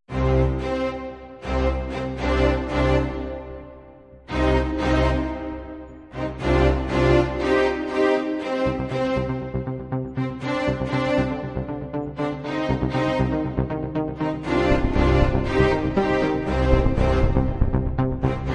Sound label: building intensity